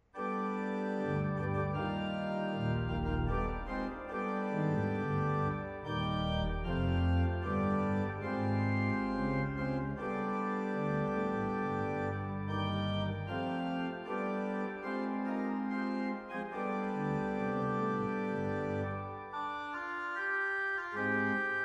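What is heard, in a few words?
Cornet Processional, organ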